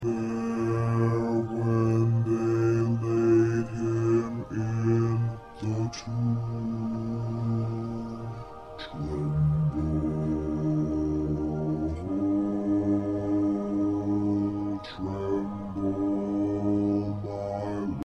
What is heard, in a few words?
Bass; Were You There